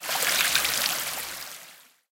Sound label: Water Trickle; BTD6